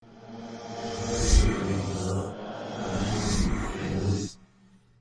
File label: resurrect, backwards demon